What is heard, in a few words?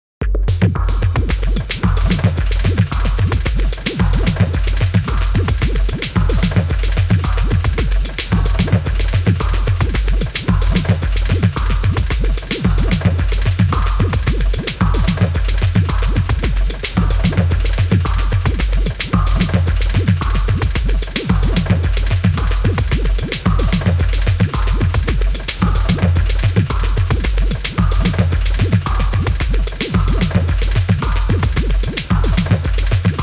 dub version